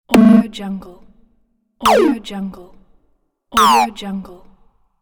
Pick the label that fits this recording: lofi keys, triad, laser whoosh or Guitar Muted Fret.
laser whoosh